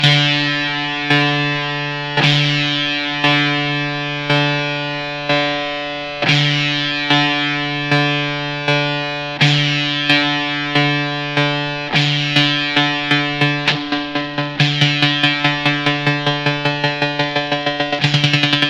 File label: trem-o-matic w- singlecoil pups; ronsound; flender